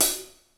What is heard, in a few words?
STEREO DRUMS